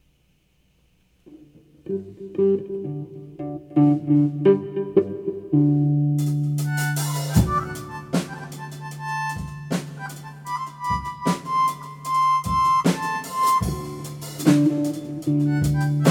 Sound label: greek italia